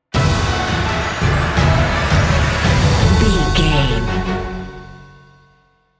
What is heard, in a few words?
epic emotional sting bumper